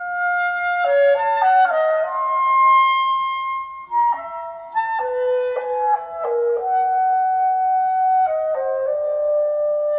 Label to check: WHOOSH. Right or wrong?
Wrong